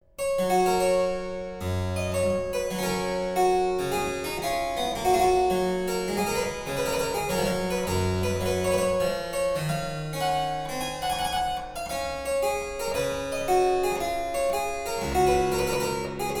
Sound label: Harpsichord Suite No3 in G major Almand